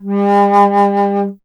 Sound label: Alto Flute, Solo Instruments